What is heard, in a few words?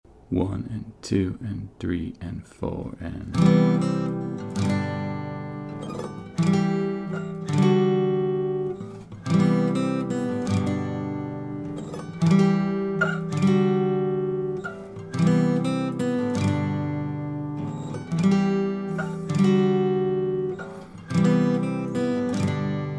adding chords